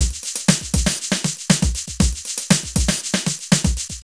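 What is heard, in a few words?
drum loops, right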